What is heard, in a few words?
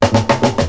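SNARE ROLL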